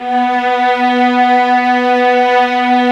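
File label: violas, ambience, piano; Roland L-CD702; Vlas Sect3-4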